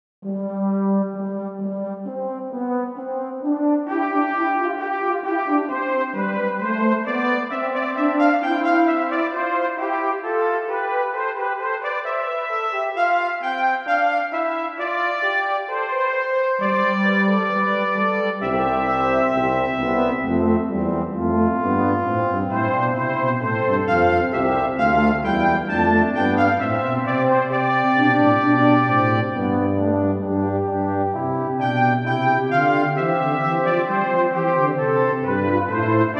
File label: brass; LOGIC